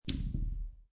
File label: Dice Throw Two Dice On Felt; Dice Sound Effects; Dice (Sound Effects:Casinos); Lynne Music